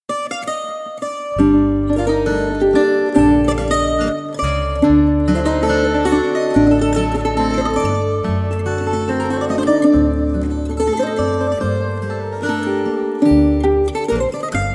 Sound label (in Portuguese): Variacoes Fado Menor SOL